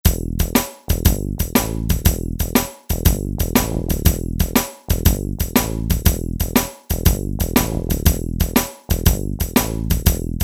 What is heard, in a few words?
Shuffle